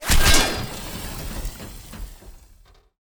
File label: pressure leak